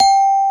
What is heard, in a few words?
xylo G